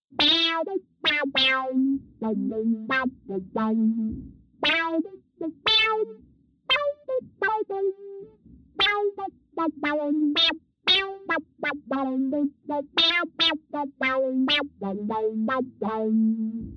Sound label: Filter Lead